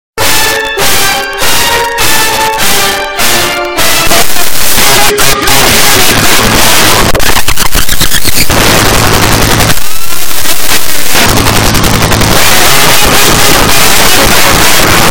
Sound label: random earrape